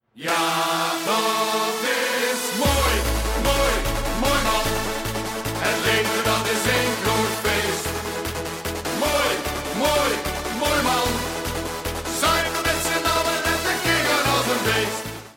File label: BEAT